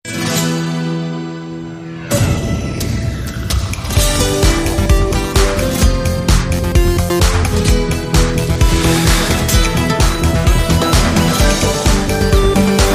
Flamenco Run

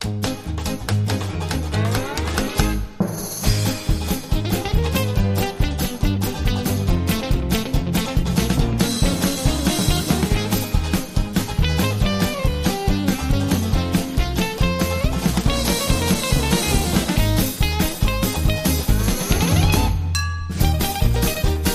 Lead Guitar No Sax

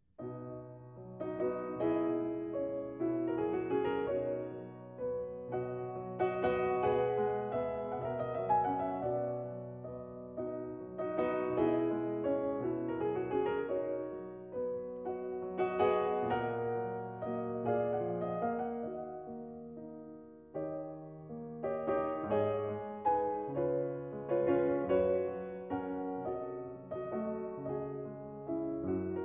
German Dances; Franz Schubert

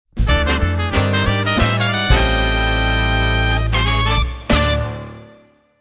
Jazz Brass, Jingles

